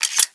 m3 insert shell, weapons

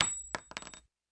shell

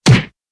hands hit, throw knife; czero

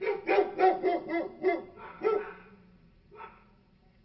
dog; bordelrats

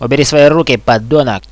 pain; hostage

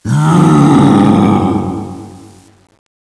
death, zombie; Zombie Darkness; hump back

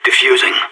defusing bomb

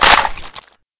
mag out, weapons m4a1; mw2

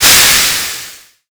chainsaw steam; Reega kz

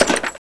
pkm clip in

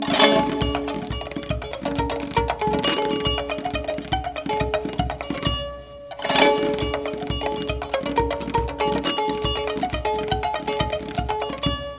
dust music

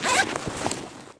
ammo pickup

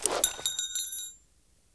pin pull, weapons

